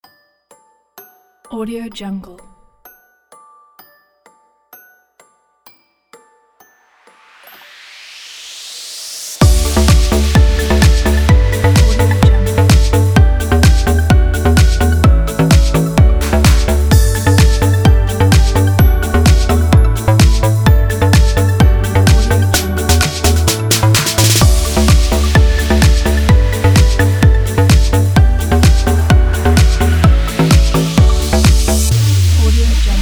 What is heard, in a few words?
twinkle twinkle little star remix